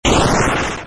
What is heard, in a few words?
Player rocket Snd; Robot Wants Kitty